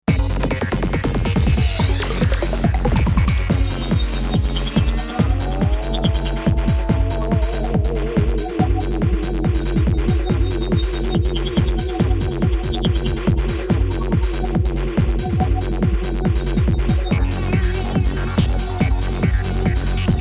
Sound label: rhythm